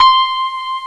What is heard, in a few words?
epiano c6; Hexen Demo; Hexen Music; Hexen 6vox